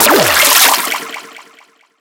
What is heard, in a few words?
Water; Skylanders Cloud Patrol; Arcade, iOS, Mobile; Skylander SFX